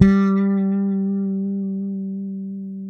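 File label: fret finger G, Pedulla bass; Masterbits CLIMAX 7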